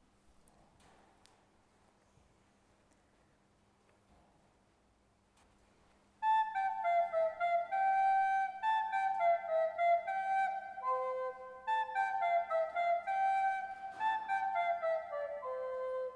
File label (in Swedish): kyrkokonserter; Live i Kårsta; Sinkla fåglar